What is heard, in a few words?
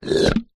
cartoon slide unblock; zapsplat